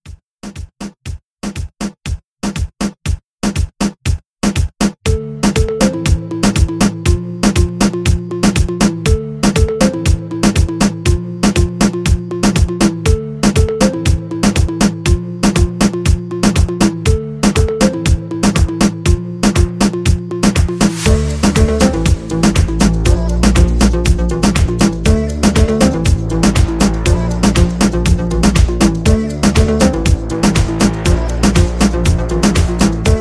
Transition 120 100

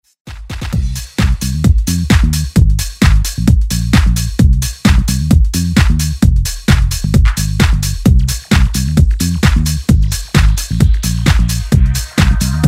Dance

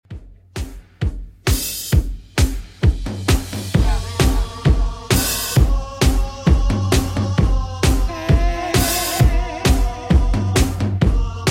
2ks Redrum 132bpm

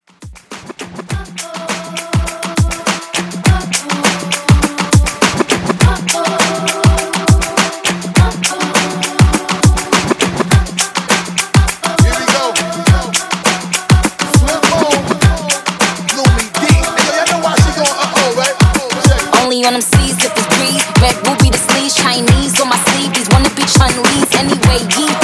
Top40s Mashup Extended Intro Outro 102 BPM

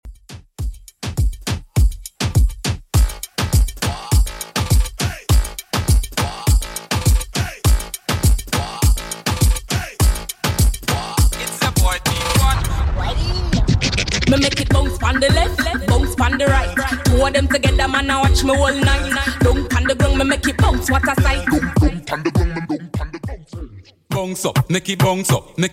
Moombah Redrum 102bpm; remixplanet

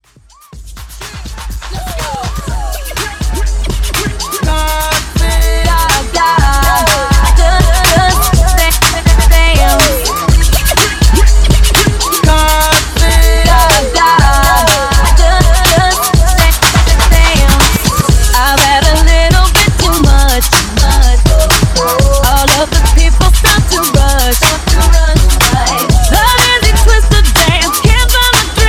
Re Drum Scratch 123 BPM

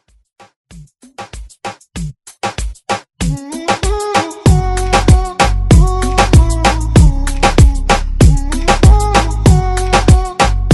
Moombah Redrum

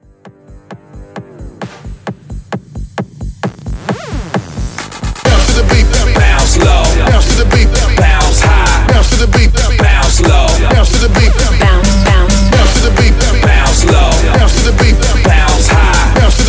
EDM Bounce Hype

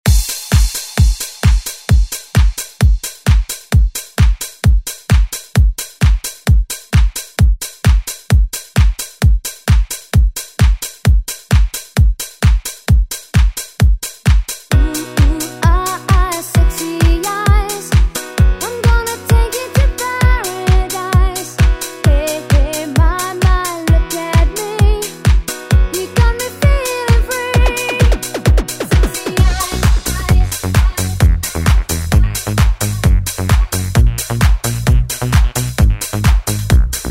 90s ReDrum; DJ Mhark

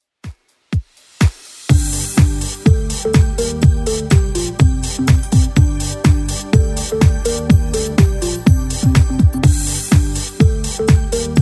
90s Redrum 124bpm; remixplanet

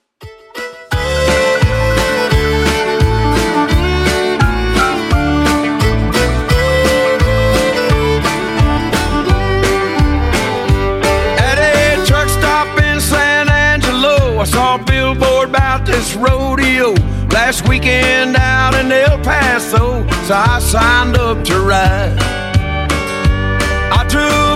2ks Country Redrum 172 bpm; remixplanet

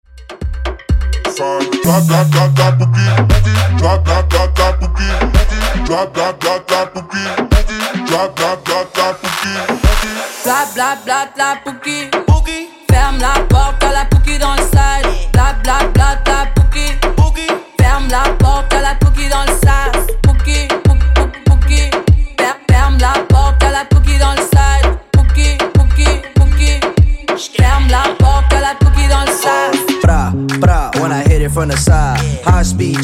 Transition 126 98

